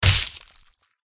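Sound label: hydra attack contact middle; gidra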